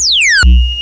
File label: noise, FX & ATMO, ALARMS & ALERTS